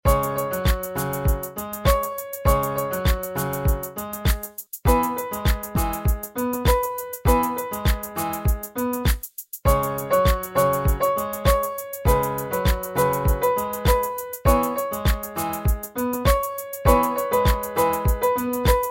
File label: Pentatonic tonic